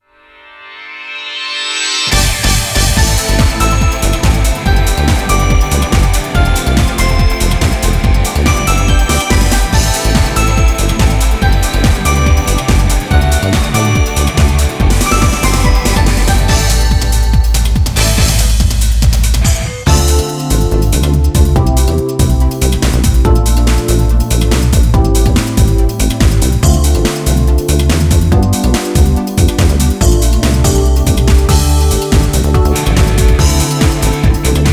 off vocal

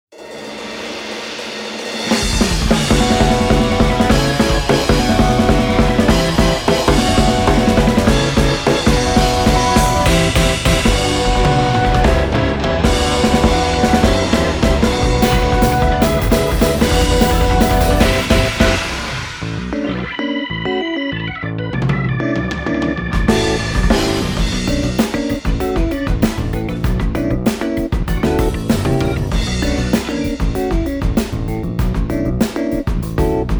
(Off Vocal)

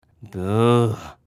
boo

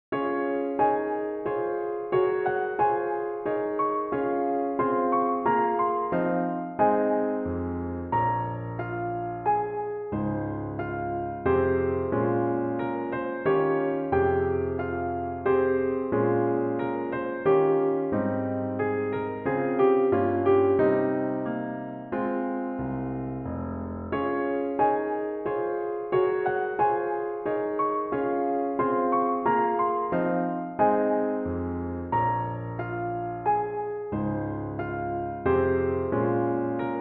Ravel Sonatine

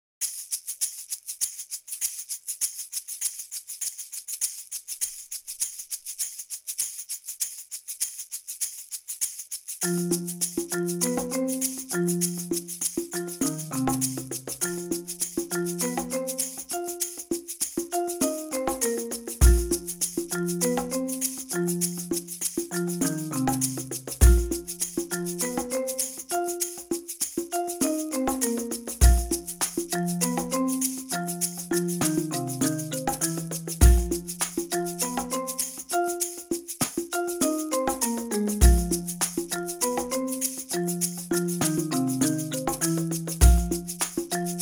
comical

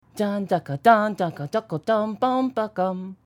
ring

